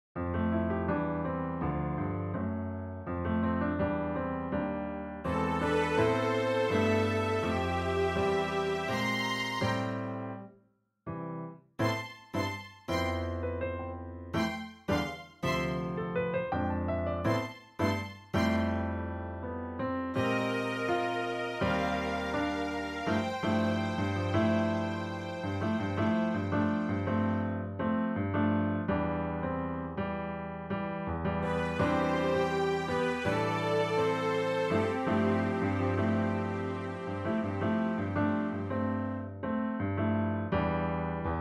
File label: piano